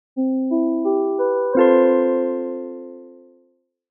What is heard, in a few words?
logo calm circle